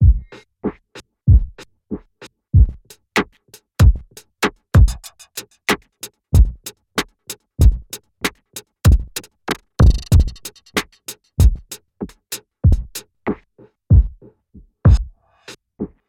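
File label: Jag filter LFO; KP3+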